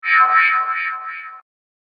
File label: Alien Vibration Force; Alien Sound Effects; science fiction sound effect